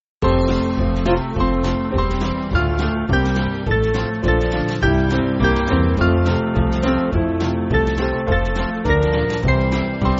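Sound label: Band, Music Backing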